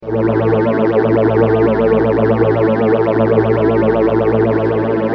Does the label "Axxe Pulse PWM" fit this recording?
No